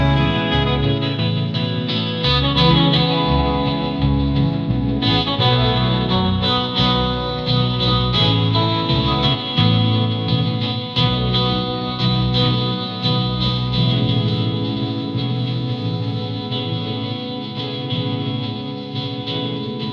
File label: ringtone country